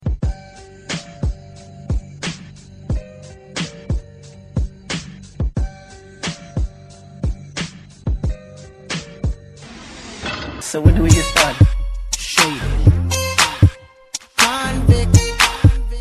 Hip Hop

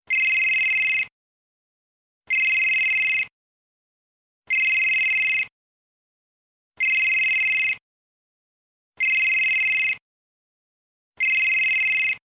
Phone

